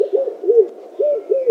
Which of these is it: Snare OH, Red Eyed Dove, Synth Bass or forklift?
Red Eyed Dove